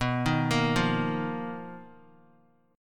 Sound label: B Major 7th Flat 5th B Guitar; arpeggiated; x,2,3,3,5,x; 4F,4A,5C,5G,5C,6E; JGuitar